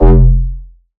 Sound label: Bass F, Bass Synth